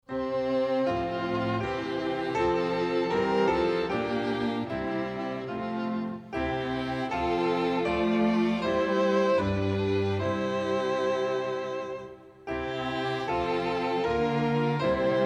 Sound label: this house we dedicate to thee words and music